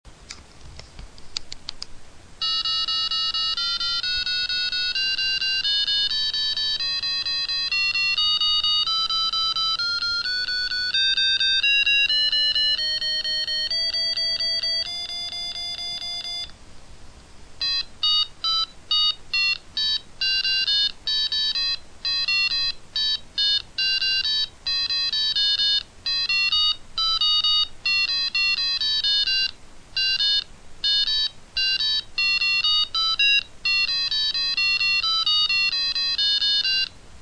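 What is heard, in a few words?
Chromatic, Guitar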